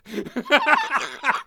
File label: Laughs